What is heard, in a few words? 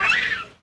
Bleeder Hurt Vox; Sid Meier's Civilization 4 Complete; Beyond the Sword; Units